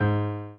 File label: G#3 Ab3, Piano